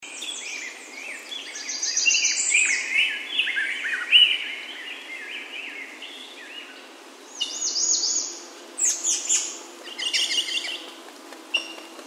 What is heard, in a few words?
Grosbeak Ridge; allegheny plateau